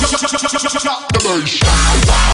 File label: drop the bass